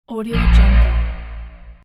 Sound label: movie trailer stab trailer song